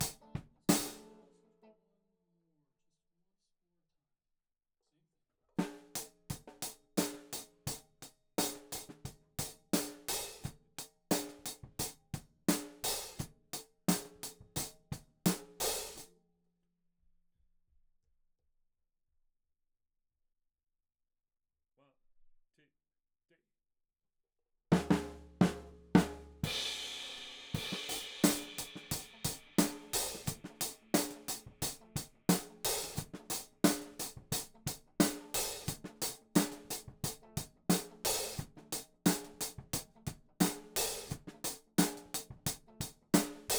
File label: Hat